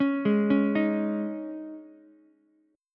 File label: D sharp 7th Suspended 4th, Ukulele; D#7sus4 arpeggiated; JGuitar